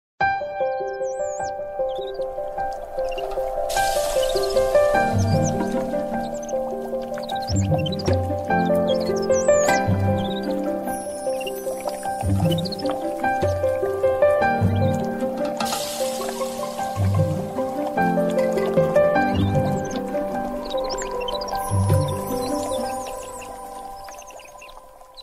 morning alarm tone with piano and nature sounds; free ringtone

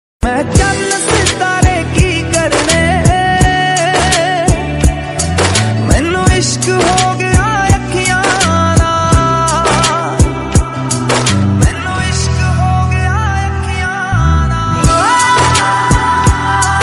ringtone hindi ringtone panjabi ringtone

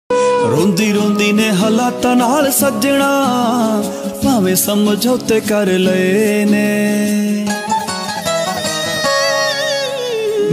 punjabi sad song

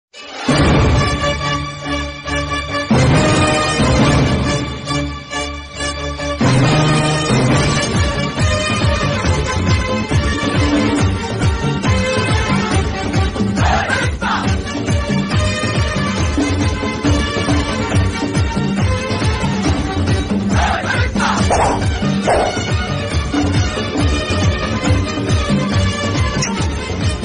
narasimha movie mass bgm ringtone

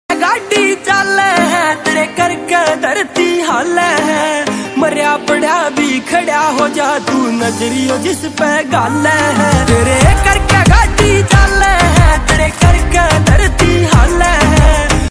haryanvi song ringtone; sonipat

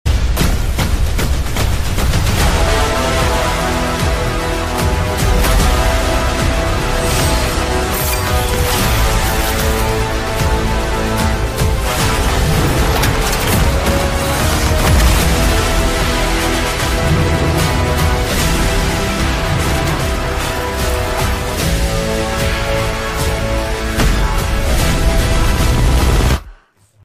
trailer bgm ringtone; stree 2

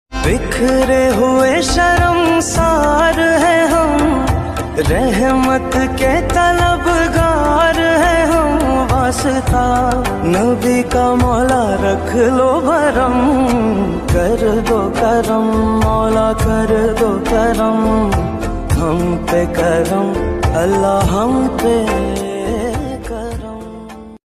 islamic iphone nath ringtone new arabic ringtones

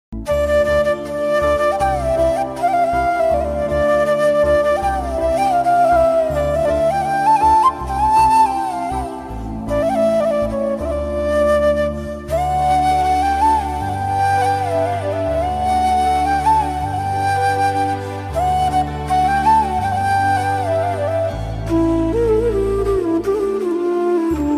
flute version malayalam song

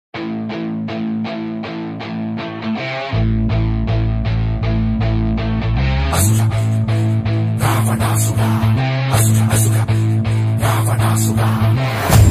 movie ringtone bgm ringtone, telugu; jai lava kusa

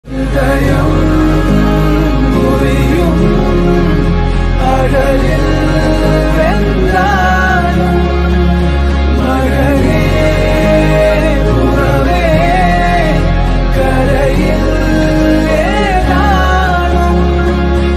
movie sad ringtone

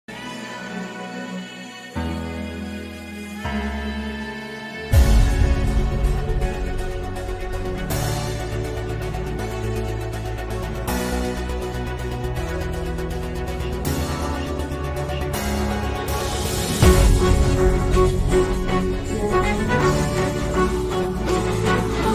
song bgm ringtone; Gaami telugu movie

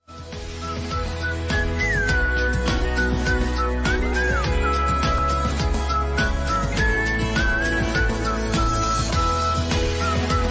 whistle ringtone; descendants of the sun

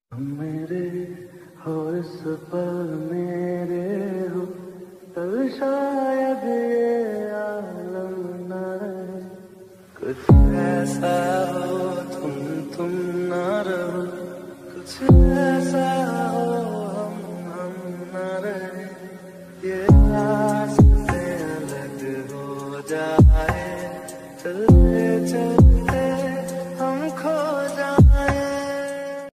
lofi remix ringtone

